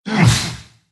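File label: dragon pain, Russian; Dota 2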